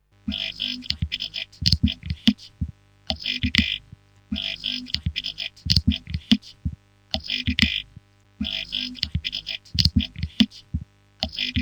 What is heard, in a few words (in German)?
automaten; rauschpartikel